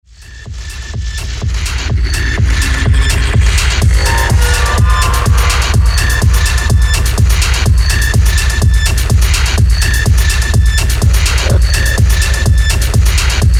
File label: Walking Taiko